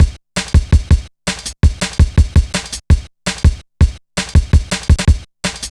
Cymbal Rise, Drum Loops 165.5; Zero-G Jungle Warfare 1, 2 & 3

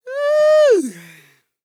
YELL, MALE HUMAN; Spectrasonics Vocal Planet

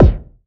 BD, BASS DRUMS; EdgeSounds

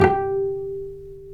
STR VC, Vcs Pz dry; STR Vcs Marc-Piz

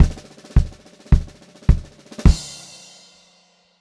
FILL, Funk Groove Drumloops; USB Soundscan vol.38; AKAI

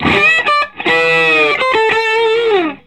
Vintage Blues Guitar, SOLO

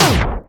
dance kit, EMU E-III, f#4; ProSamples vol.55 - Retro Sampler

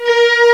VIOL MID, FAIRLIGHT CMI IIX, STRINGS

